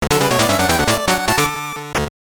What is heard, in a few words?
Jingle; Grander Musashi RV; SGB